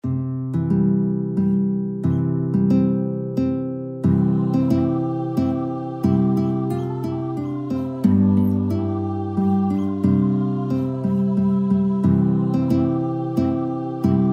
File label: voice guitar; Hej Slaveni; hey slavs; gitara